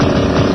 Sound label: V8 Sample; 暴力赛车